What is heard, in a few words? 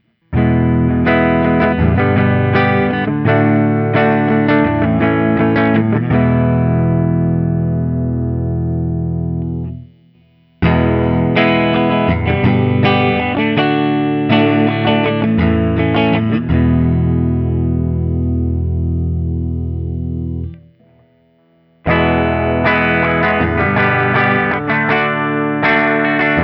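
Burnside Lance ODS100, guitar; guild burnside lance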